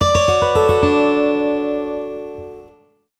Curtain E-mail (Waterfall); Sony Ericsson; Xperia X1

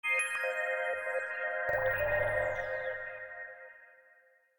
Glance View, ui; Samsung Galaxy Pop SHV E220s; note2

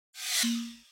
Fold close, Nokia N76

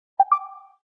Bluetooth alert; Optimus G F180K; ui; LG

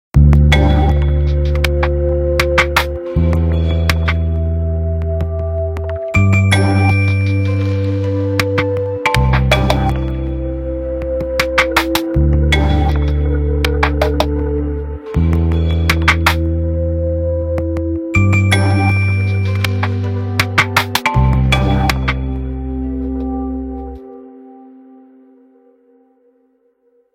Accent, Nokia Tones; Windows Phone apps

